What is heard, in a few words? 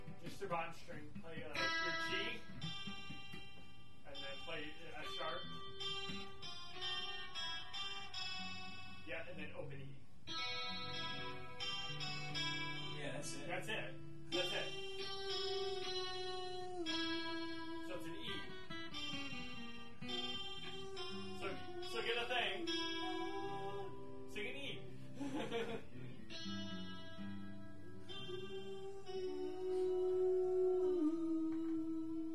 Knocking with good harmony